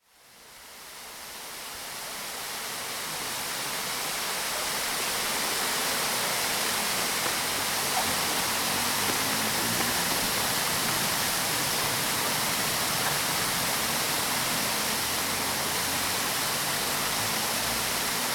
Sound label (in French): tiznit chute eau; resonances oasiennes